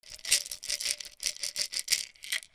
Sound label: BATHROOM